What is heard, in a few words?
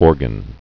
organ